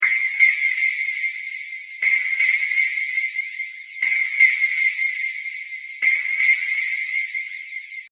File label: Body Scan; ringtones; iPhone3000